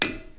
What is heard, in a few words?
crash; outfly